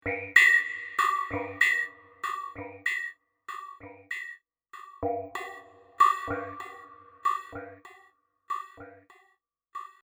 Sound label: pad